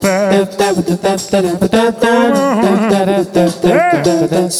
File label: VD BEATV 1, Roots Funk; USB Soundscan vol.41; AKAI